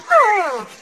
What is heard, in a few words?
whooooo; yoshi npc